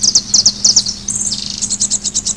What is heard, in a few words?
bird chorus at dawn; Sound Effects for Multimedia - Volume 1; birds